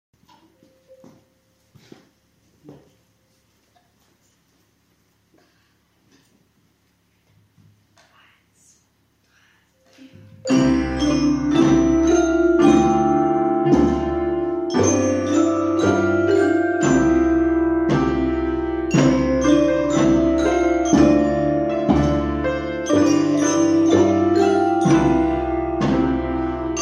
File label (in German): Instrumente Aufnahme; Stufe3